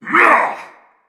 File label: shout